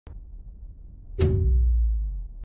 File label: squid game sound elimination